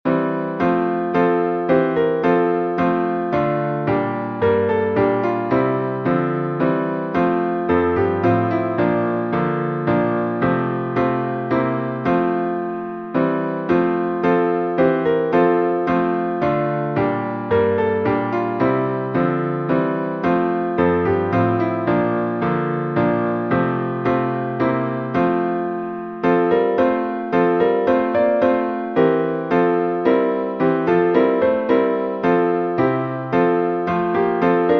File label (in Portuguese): salmo playback